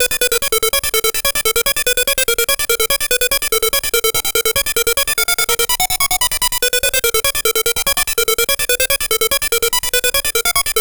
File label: 145 bpm amen break